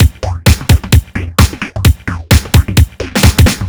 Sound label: No Hats, Drum Loops 130bpm; Zero-G; Soundclash Nu Dance Electro; Acidized Wavs; Chicago Love